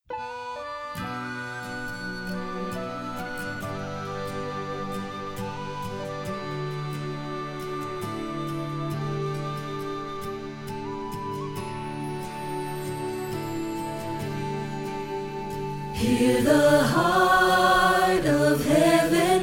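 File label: soprano, Choir Rehearsal Parts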